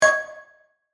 Multimedia Inquiry, Technology, Multimedia, Inquiry, Element; Alerts